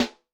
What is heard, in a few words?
RIM short, snare; Yamaha DTXpress-II